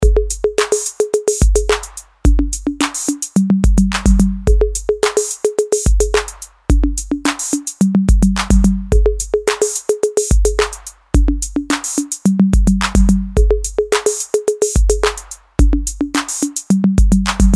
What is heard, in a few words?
Happy Congas, Roland TR-808; Custom rhythms